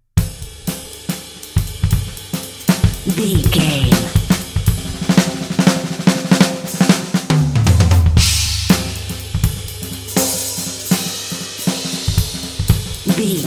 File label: drumming around